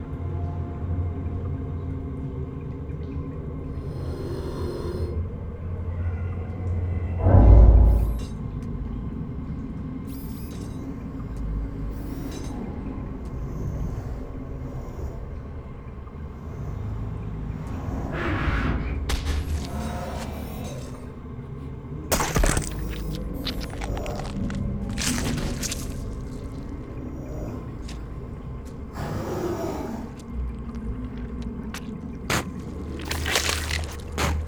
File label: bathroom; Doom